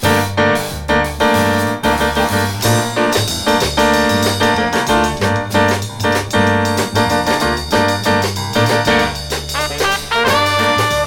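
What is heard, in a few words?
start, minor-key agressive bossa; call-and-response intro between harmonized horns and piano; the head is harmonized throughout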